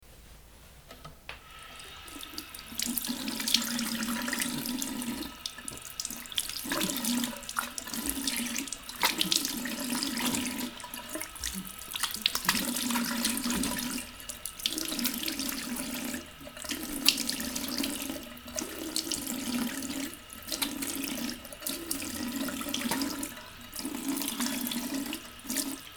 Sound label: washing and drying face